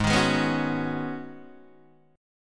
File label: G sharp Minor 11th G sharp Guitar DADGAD, chord